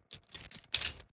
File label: Reading bow